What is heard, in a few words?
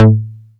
MINI PLK A2, MINIMOOG; Big Fish Audio - First Call Vintage Keyboards